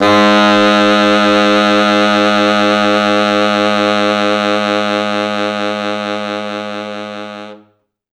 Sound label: T Sax VBFF, Quantum Leap Horns Sax